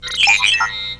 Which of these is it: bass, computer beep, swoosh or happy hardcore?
computer beep